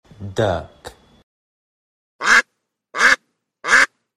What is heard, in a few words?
duck